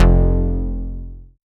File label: Bass, Synths, 5U Modular; Polyend; Simulacrum Sounds; 1 Shots